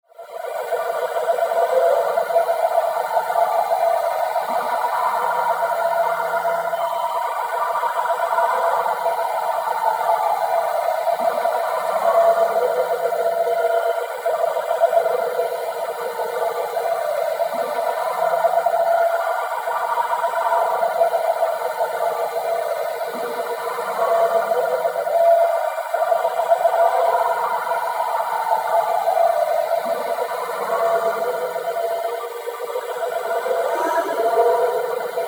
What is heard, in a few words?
new highpitch electric